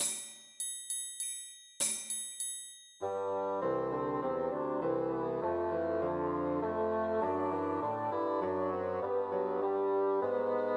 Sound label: We Wish You a Merry Christmas Christmas Trios for Three Bassoons